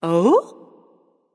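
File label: confused, female; smite; voicepack; hera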